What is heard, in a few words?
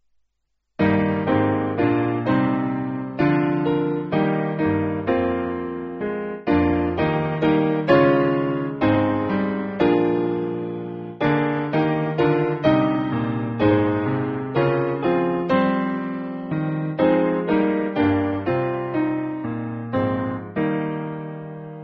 When Christ Was Piano